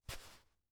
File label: footsteps soft sole shoes single step left on sand